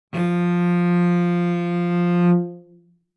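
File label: Cello arco fortissimo sul D F3; MIS Pitches - 2014; Strings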